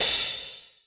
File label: drum hit finish; osu